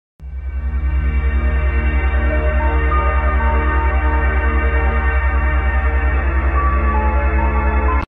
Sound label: Binaural waves